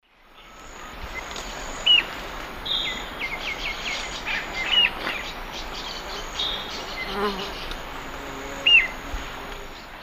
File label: Myrmochanes hemileucus, call; S. America; Eastern Lowlands